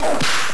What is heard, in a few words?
punch; ikplus; atari st